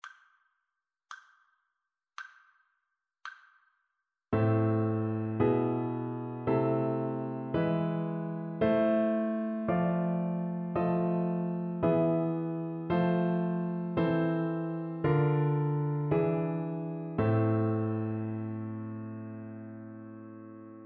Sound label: harmonic dictation; ear training